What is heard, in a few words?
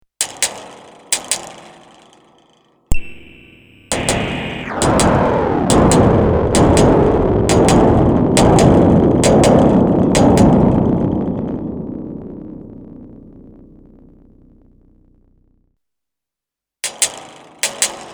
metal